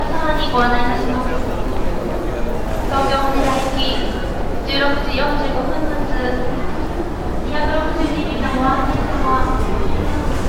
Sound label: airport gate